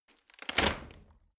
rotten wood break

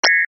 button activation

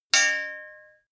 knives large hit chink

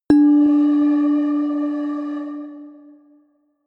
melodic odd ghost bell high

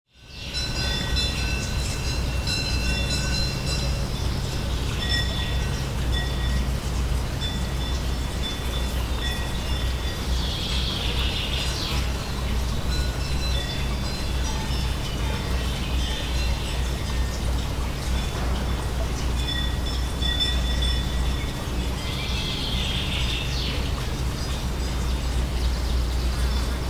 cowbells in nature

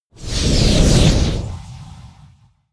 whoosh air

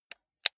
console controller playstation press slow

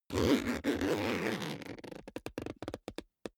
zipper backpack close unstable

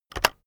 keyboard external enter press very fast heavy

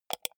computer mouse wheel click fast

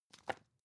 magazine page handle

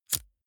lighter wheel spin flame burst

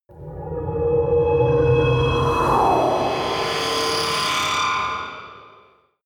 whoosh scifi gurgle

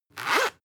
zipper close fast

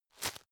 tissue grab

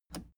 plastic button scratch smooth push disable

